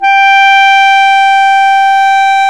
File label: Clarinets